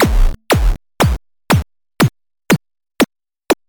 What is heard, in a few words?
Chip Kick; Retro Noise 3 Instruments